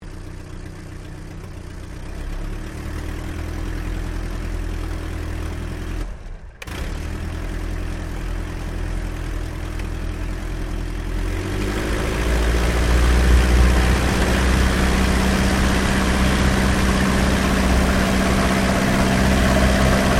Aircraft Dehavilland Tigermoth Takeoff Interior, RAAF Tigermoth biplane training aircraft